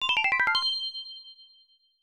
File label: Logo; Doukyusei 2; OPNA